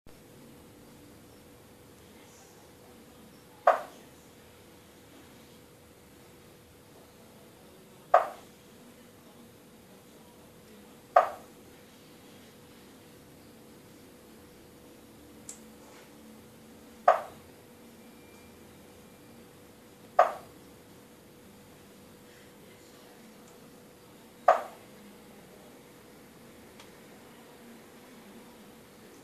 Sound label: Striped marsh frog